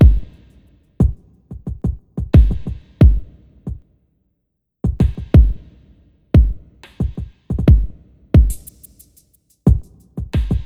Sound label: Industrial C, chillout industrial c; сэмпл ударных